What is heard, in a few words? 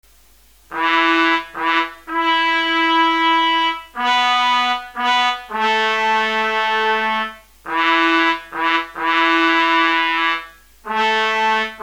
Trumpets without BC; rendering of the midifile WITHOUT the use of the BC control change messages; professional trumpets bank